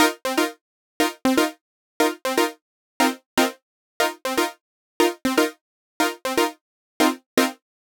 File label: Chord Synth Cm, 120bpm; Zero-G; Extra Chord Progs